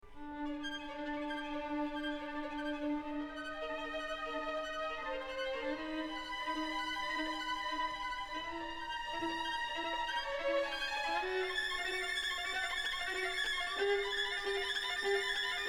Violin Concerto, Per l'arpeggiato

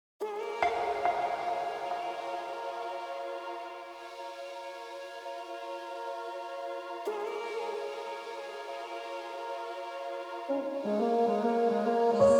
Instrumental

